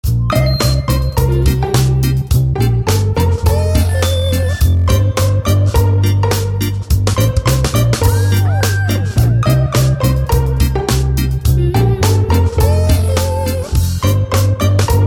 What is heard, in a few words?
Ska; happy